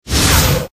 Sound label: enfeebling blow effect; abilities